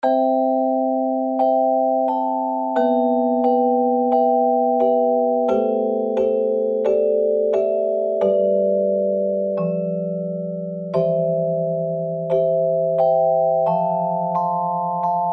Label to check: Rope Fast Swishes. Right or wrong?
Wrong